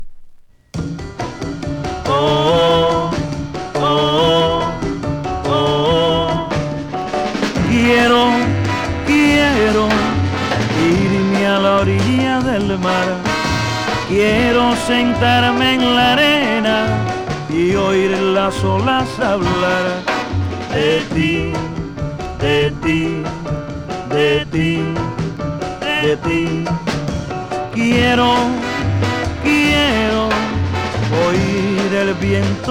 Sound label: Latin Soul Northern Soul